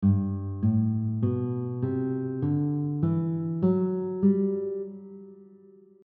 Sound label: G Major scale one octave, guitar; G Major Scale one octave notation